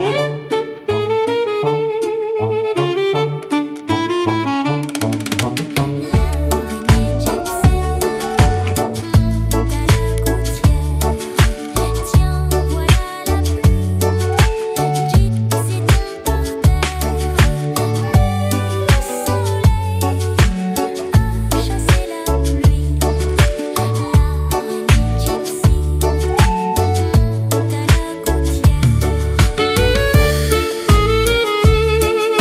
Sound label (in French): araignee gipsy karaoke, chansons comptines; l'araignee gipsy